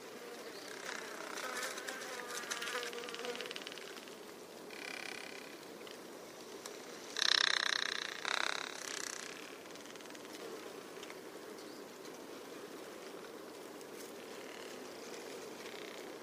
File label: wolf spider drumming